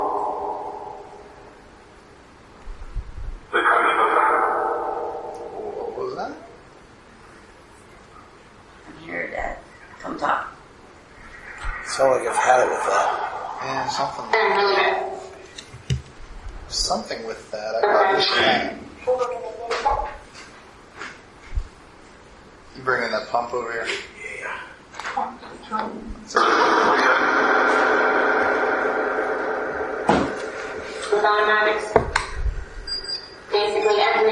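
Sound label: 2nd Floor Party Room Phasma; 1810 tavern investigation return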